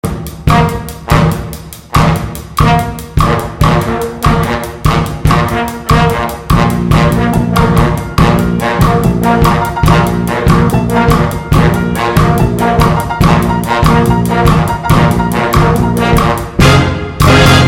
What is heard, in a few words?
Bigband Soul Bossa nova; SBS